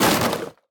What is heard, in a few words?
Break Wood Impact; boomerang fu